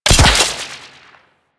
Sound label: grapple fire; Enemies; Warframe; Kela De Thaym